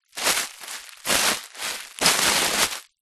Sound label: office bubble wrap sheet movements